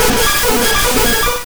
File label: Bubblebeam Direct; sfx; attack moves; pokemon; gen 1; rby